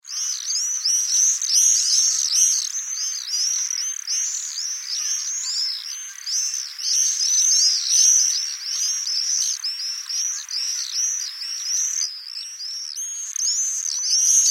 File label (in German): mauersegler; Voegel; Artenschutz am Gebaueden